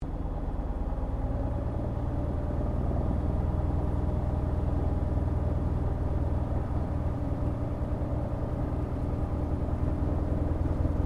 AIRCRAFT CESSNA O-1 BIRDDOG PREFLIGHT INTERIOR, spotter aircraft; Airplanes; Vehicles